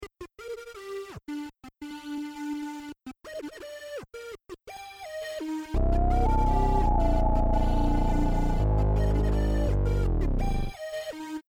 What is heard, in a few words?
round ten sec count, music